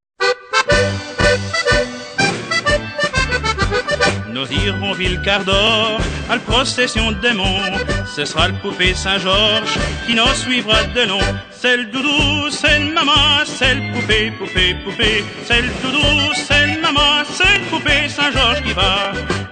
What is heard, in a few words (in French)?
Doudou Chant Phone